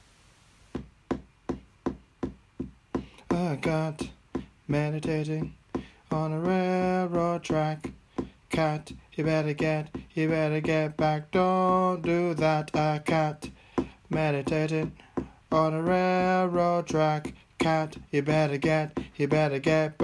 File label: the meditating cat